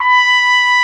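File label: Big Band Fast, trumpet; Roland L-CDC-01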